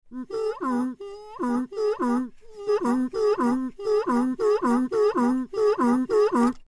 Motor Pump; Small Manual Piston Up / Down Chugging With Bellow Honking Hi / Low Comical